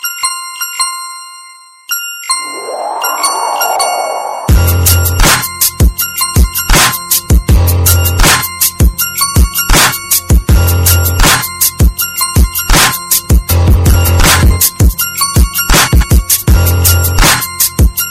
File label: Club Song